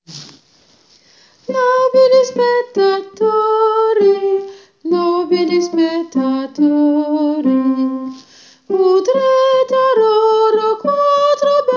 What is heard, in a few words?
contrapunto soprano